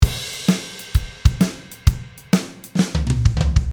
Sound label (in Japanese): drumフィル